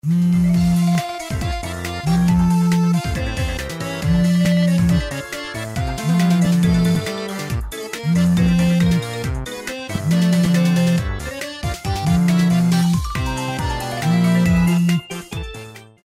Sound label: Ringtone; Xenoverse Per Aspera Ad Astra; Aster's Ringtone